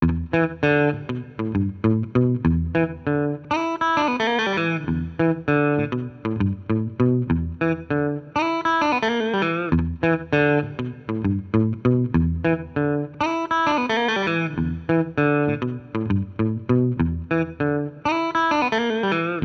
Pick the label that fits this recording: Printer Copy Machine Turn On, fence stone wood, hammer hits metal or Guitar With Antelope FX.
Guitar With Antelope FX